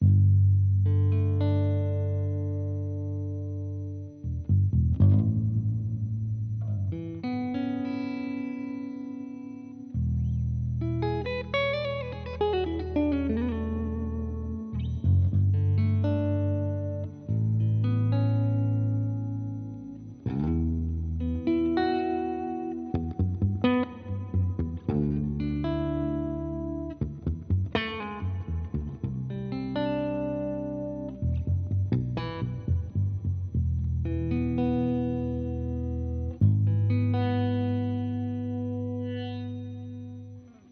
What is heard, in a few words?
Clean